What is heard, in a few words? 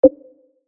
Btn Bet